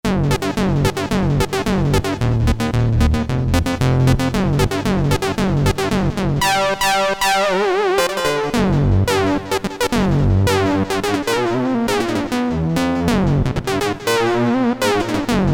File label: Synth, Korg DSS-1 DWGS synthesizer presets; Korg DSS-1 Sound Library